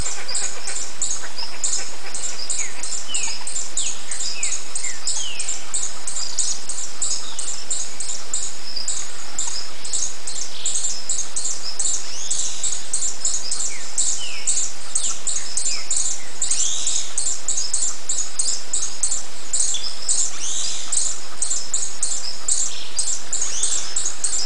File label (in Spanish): Picaflor Copetón, Stephanoxis lalandi, Black-crested Plovercrest; cantos